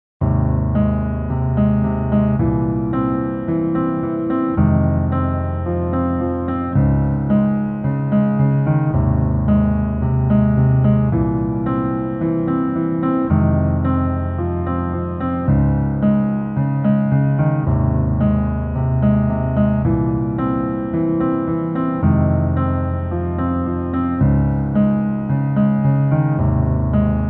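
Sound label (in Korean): Piano version 남자키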